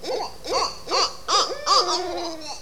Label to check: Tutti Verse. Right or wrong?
Wrong